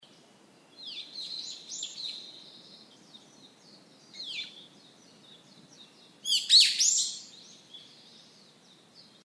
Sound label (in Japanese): hiyodori